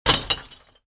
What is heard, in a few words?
Glass bottle throw in trash